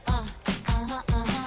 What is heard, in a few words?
ah aha aha